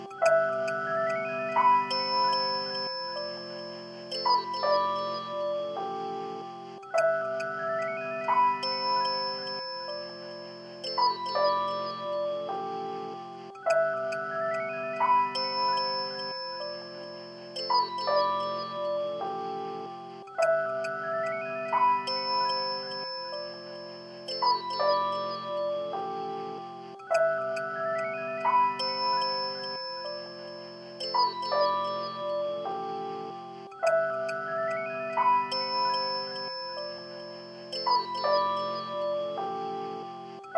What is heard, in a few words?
ambient music created using the kaossilator pro